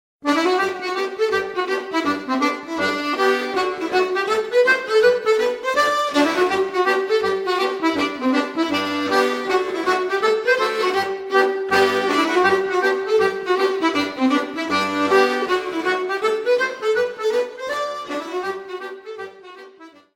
ANGLAISE - Belgique

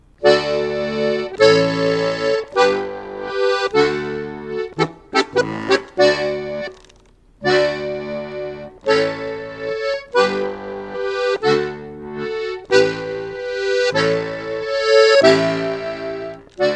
Ruckwartspolka - Autriche

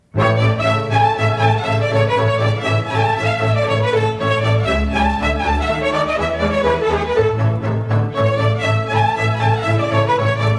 Sorocul, Roumanie; Sorocul de la Comlosul mic

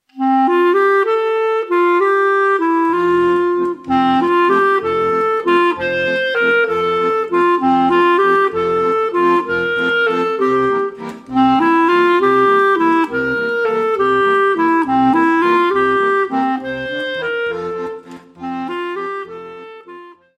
Valse, Belgique

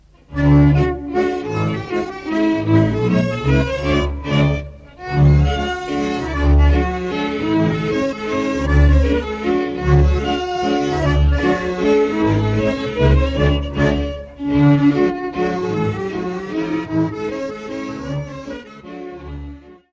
Mazurek Podlaski, Pologne

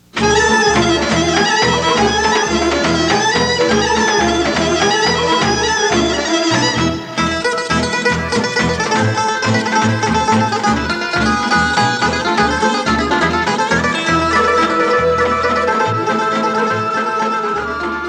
Rachenitsa, Bulgarie

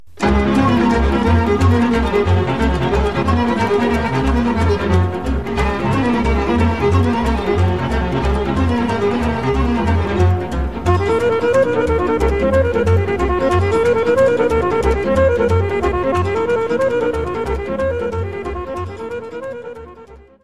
Sirba - Roumanie

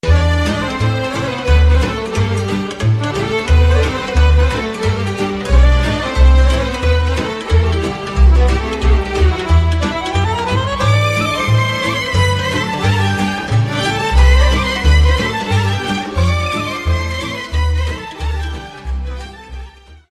Hora - Roumanie; Hora de Ascultare

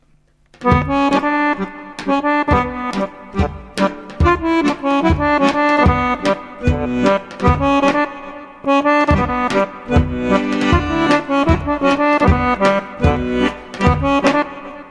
Zvonce Kolo, Serbie

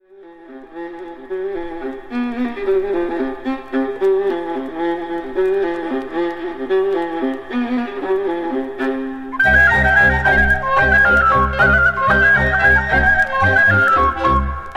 Maruntica, Roumanie

